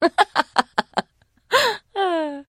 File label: trader laugh; 7D2D; 商人杰恩（七日杀）